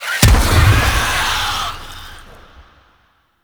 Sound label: Infested Drop Pod Impact; Warframe